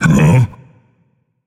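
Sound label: non-verbal confused; smite; atlas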